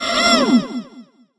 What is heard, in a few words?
hurt vo